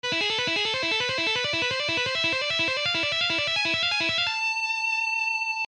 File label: Shredding Exercise